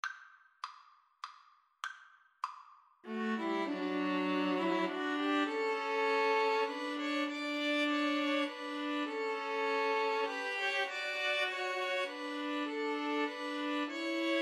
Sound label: first noel, string trio